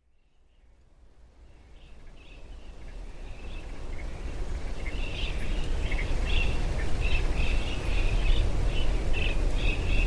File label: Wetland soundscape, chile; Felipe Otondo